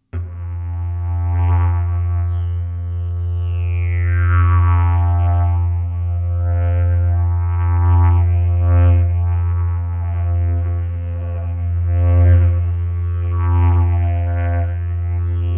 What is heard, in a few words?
didge